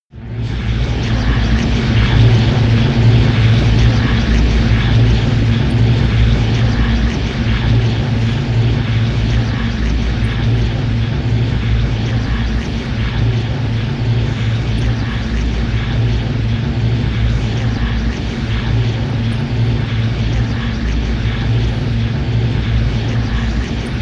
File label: drone storm high